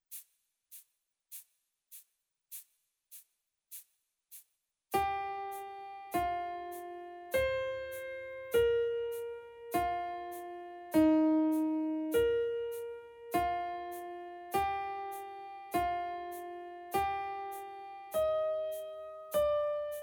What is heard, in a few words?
Bass; LinkAge